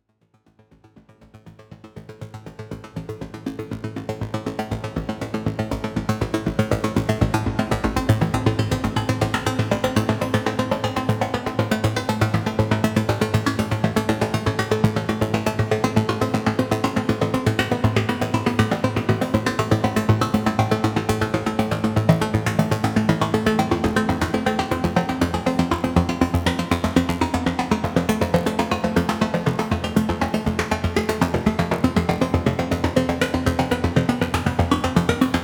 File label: circuit station